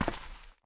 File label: Nomal Wom Walk